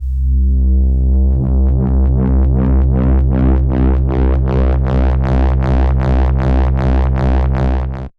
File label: Moog Phat, techno drum fx; Single Hits